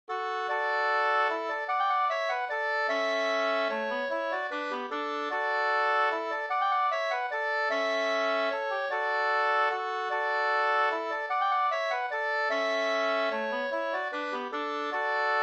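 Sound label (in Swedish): vals, two-part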